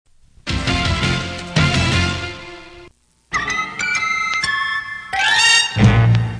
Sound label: Outcue music